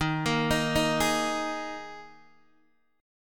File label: Eb Suspended 4th, Guitar, DADGAD